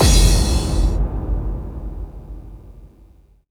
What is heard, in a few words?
BD, Dance Drums